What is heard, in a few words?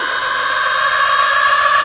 Electro FX; Ueb HE Electro FX